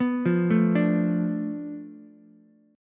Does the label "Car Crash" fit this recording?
No